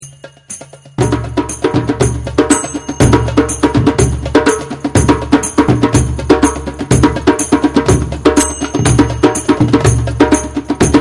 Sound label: War Groove in 4